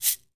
Cabasa; Percussion; Cymatics - Infinity Beta Pack 2.0; Zeus Percussion Collection

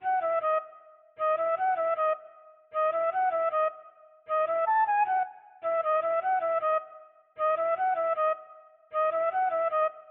155 BPM C# Min Flute, Loop Stems; Melodies; Cymatics; 2022 Melody Mystery Expansion; Freezer

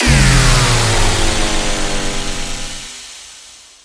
Blur Impact, Dubstep; One-Shots; Effects; Ghosthack; Dubstep Sounds 2025

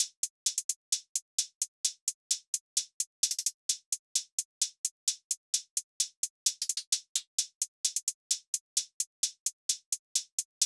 Drum Loop - 65 BPM Hihat; Loop Stems; Cymatics; DOPE DRUMS VOL. 1; Peach

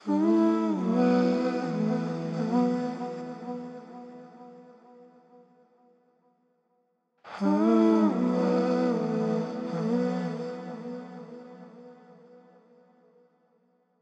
Vocal - 130 BPM B Min; Cymatics; Orchid Sample Pack; Vocal Loops

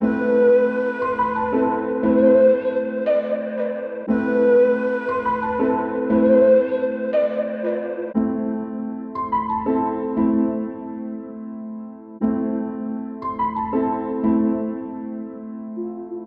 118 BPM A Min, Trap; Cymatics; 2022 Melody Collection; Trap Pt 2

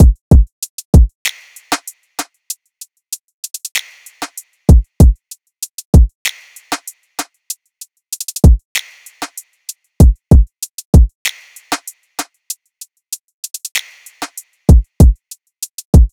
Drum Loop 96 BPM; Forgotten; Cymatics; Vibes II Drum Loop Collection

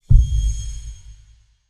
Organic Magic Accept Quest Drum Impact; User Interface

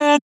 Dry One Shot - D, Tonal Vocal; Cymatics; Euphoria Vocal Sample Pack

